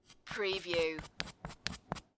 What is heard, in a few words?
Billiard, Pool, Snooker - Apply chalk to tip of cue